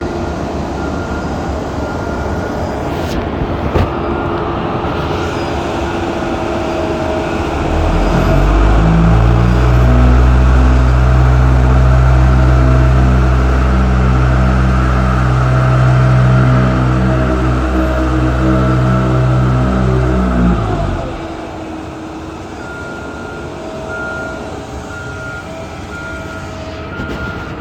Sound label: Steamroller, field recording; nature